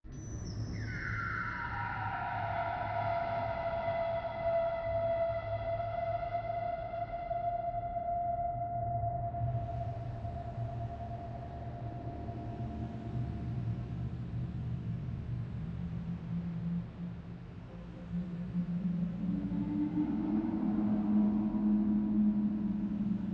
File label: Ambiance; Experience112; Mus Tyriad Ambiance